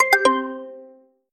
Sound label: notification